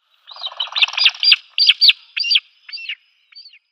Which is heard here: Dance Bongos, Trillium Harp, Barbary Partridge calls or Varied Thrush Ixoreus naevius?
Barbary Partridge calls